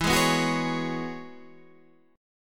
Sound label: E 6th Add 9th E down Guitar Half Step Down